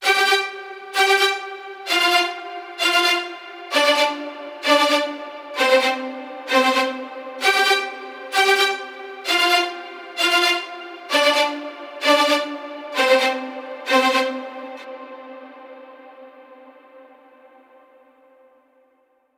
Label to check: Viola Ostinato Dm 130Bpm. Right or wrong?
Right